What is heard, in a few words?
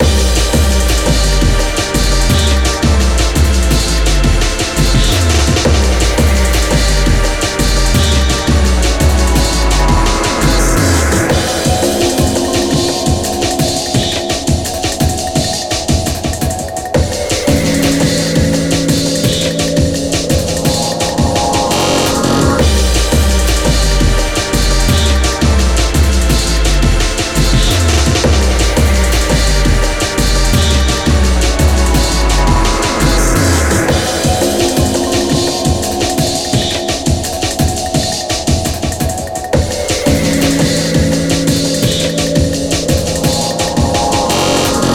Game Clear; SpikeOut Battle Street; Xbox